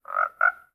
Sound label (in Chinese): 青蛙待机声